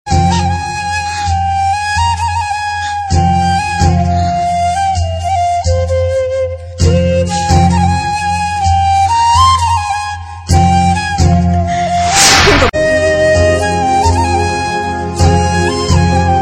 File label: Sad Tone